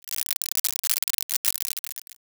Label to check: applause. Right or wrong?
Right